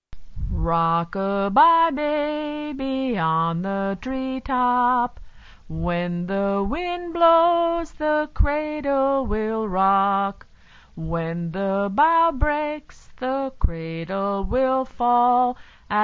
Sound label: rock a bye lullaby